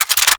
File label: Flame Thrower Reload; Warframe